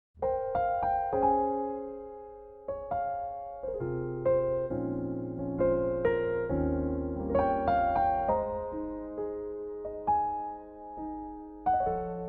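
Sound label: Ab piano, male; key - Ab to Bb - range - Ab to F; Piano only arrangement; Pianotrak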